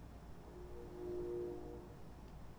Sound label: train whistle